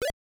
Flap

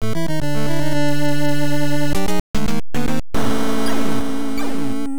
Death; Strider; NES

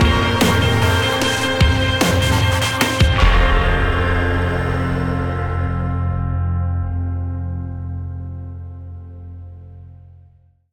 boss battle End; Griftlands

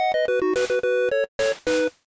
Jingle, Clear; Tuner Fight - Clear 2; Nintendo DSi

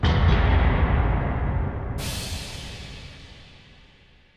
Tymp

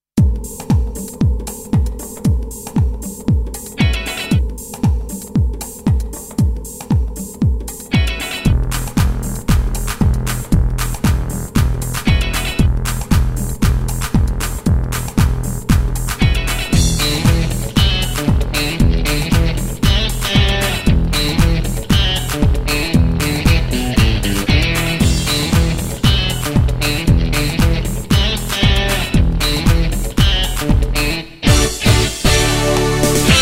bgm overture; Flying Girl Striker

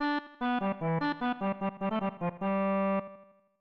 Organ Song; Live A Live; SNES

